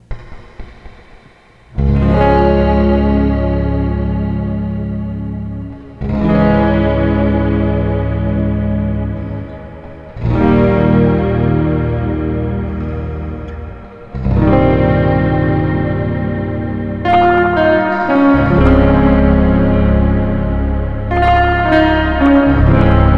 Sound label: slowed version